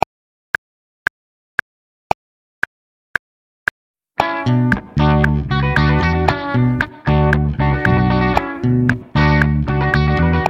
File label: Funk Rhythm Improvisation